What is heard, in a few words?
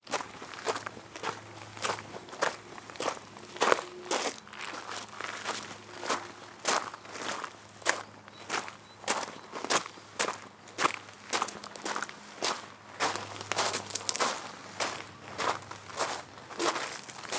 Gravel Walk